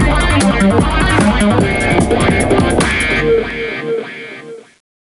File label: Desert Win